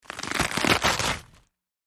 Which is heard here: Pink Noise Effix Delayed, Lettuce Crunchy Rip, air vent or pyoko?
Lettuce Crunchy Rip